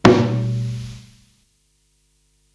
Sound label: Rack Tom, Psychedelic Drums